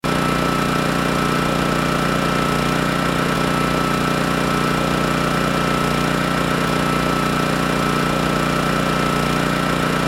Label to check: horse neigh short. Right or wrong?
Wrong